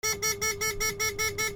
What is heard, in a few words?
ALARMS